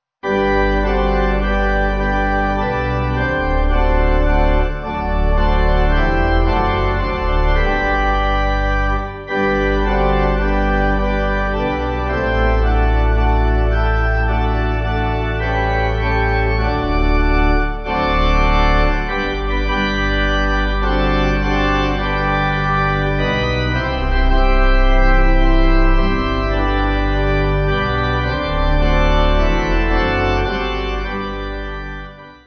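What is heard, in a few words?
pipe organ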